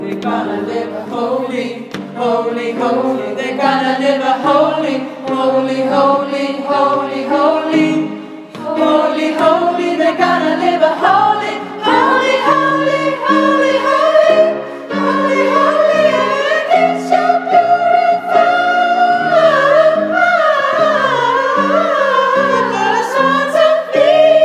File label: Sopran